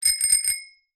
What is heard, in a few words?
bicycle bell ring; vehicles